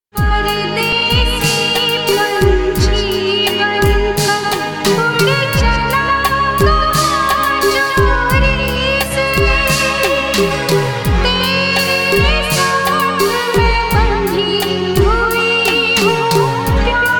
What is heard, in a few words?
jhankar beats